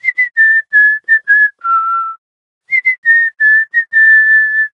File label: Whistling